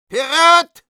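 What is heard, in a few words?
battle cry, Russian; Medic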